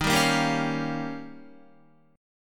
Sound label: D sharp 9th, Guitar; D#9 strummed; JGuitar